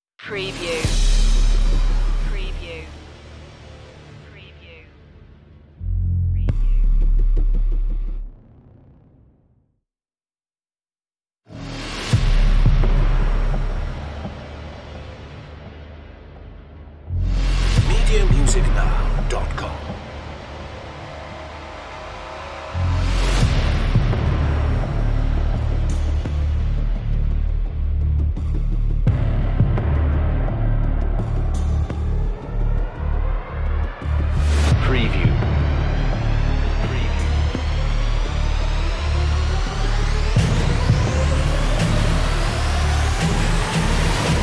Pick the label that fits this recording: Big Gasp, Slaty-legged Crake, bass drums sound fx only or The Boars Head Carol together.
bass drums sound fx only